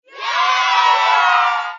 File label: yayy